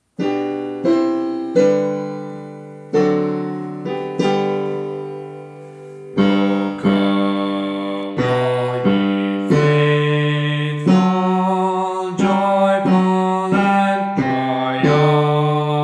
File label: Bass